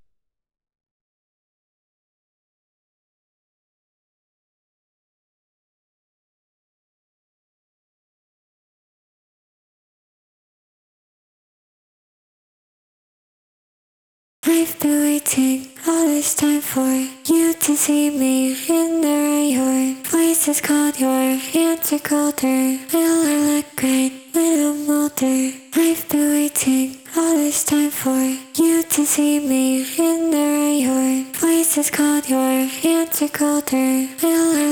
main vox

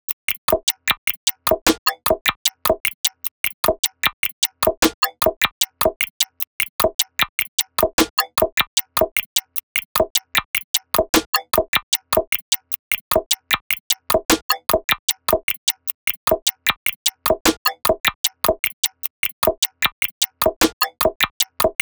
152 PERCUSSION

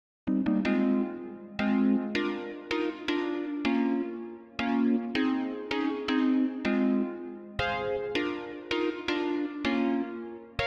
chords elec

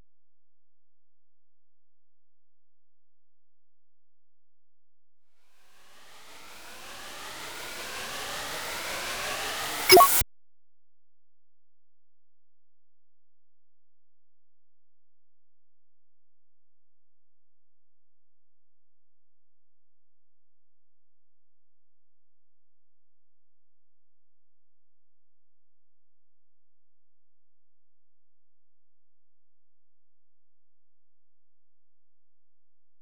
riser

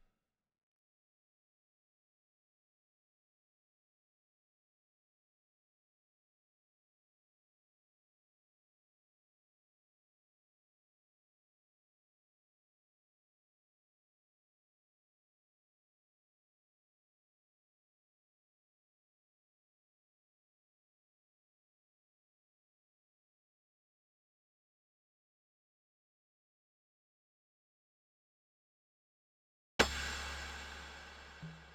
perc

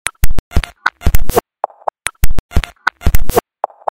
weird percs 120

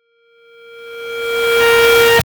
FX

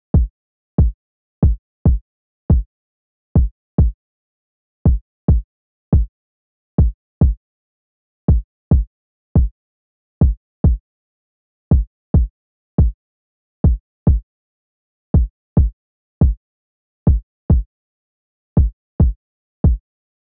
kick

